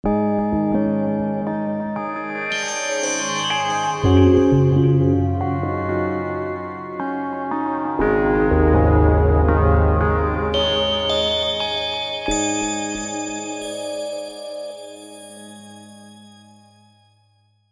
Zero Gravity; V-Synth

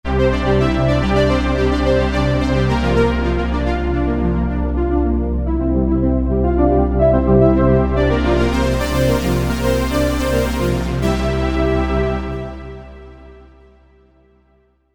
Phatty Strings; V-Synth

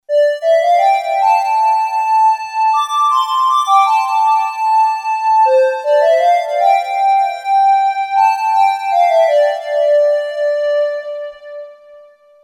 Ambient SQR; LEAD; sh-201

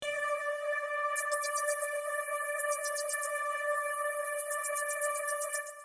Foghornz; V-Synth

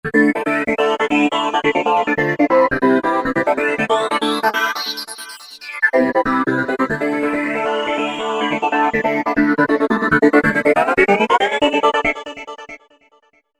Sliced Vox, v-synth

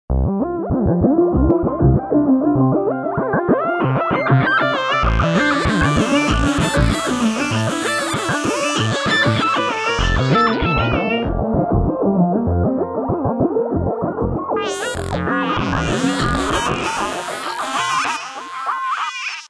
Dawn Sequence, V-Synth

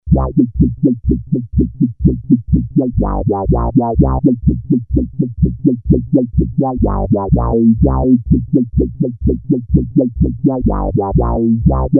Juicy Fat, BASS; sh-201

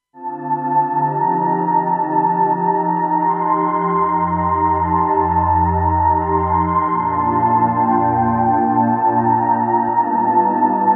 The Choir, PAD

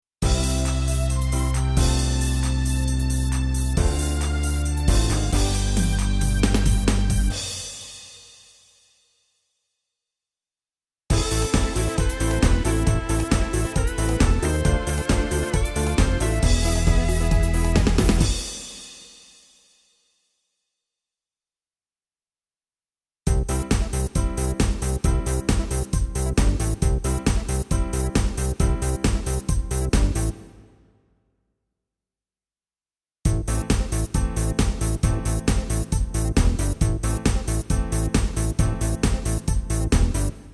HunDisco

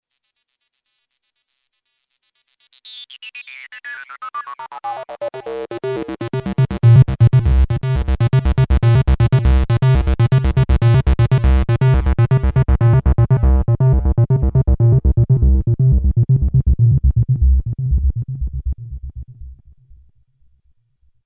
Sequence Bs; BASS; sh-201

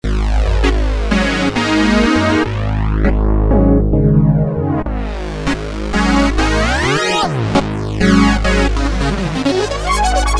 Fat Squares, V-Synth

